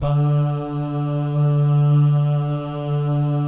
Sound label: BAH GUY, BAHS GUYS; Spectrasonics; Vocal Planet; Jazz FX